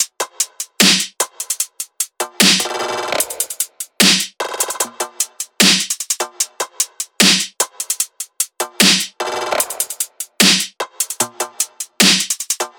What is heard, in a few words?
tops 150, Drum Sample Pack Loops Top Loops; SD SEISMIC; Slate